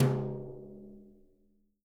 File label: BRUSHES, Living Drums